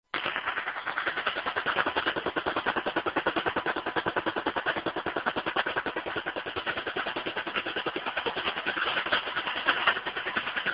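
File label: signal 3 9.5 MHz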